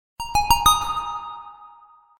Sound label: Echo